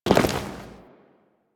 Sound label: Tenno Land; Warframe; Lotus Intro